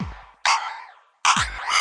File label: 132 bpm, phasey clap break, drum loops and breaks